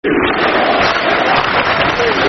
Leeds Football Chant (Soccer Song); fanchants; Leeds Lufc

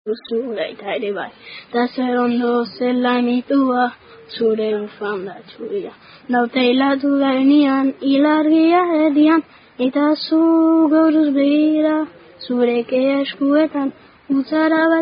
French Rugby Rhyme; Le Gamin; Sing For Rugby

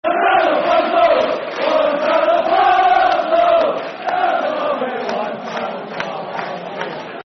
Football Chants; One Dado Prso; Rangers GRFC

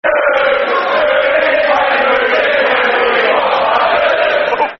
Football Chants (Soccer Songs); Portsmouth Pfc

